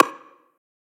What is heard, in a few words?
perc rimmy; one shots; SEISMIC Drum Sample Pack; Slate